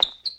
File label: Fly Ship slide